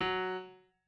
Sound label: piano